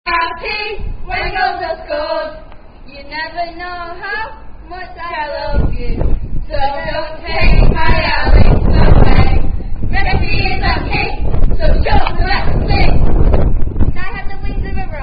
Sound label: Footie Songs